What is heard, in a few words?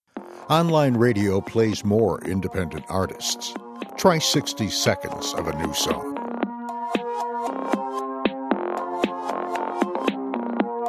pop